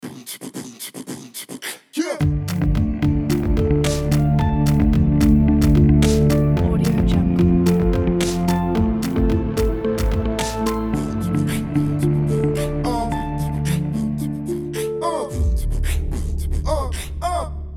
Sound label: electro tech hip hop intro